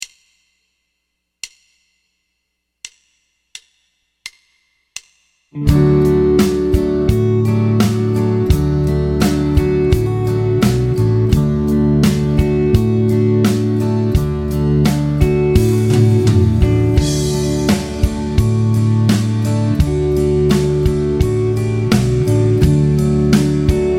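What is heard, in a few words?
Triad Vamp major D; Piano Practice Tracks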